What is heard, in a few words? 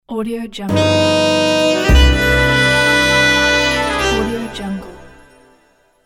jazz sax logo; mr b music studio